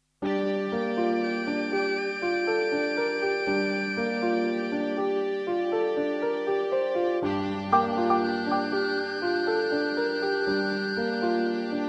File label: fusion; Dynausaurussen in hun poging te overleven in de k; AG fusion